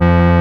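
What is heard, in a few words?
accordion, synths sh101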